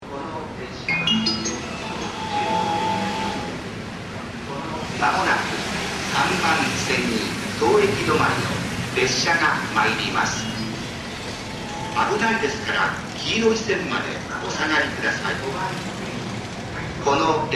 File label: sekkin; kozu